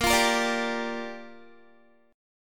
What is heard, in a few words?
Bb 6th Bb, Guitar; Bb6 strummed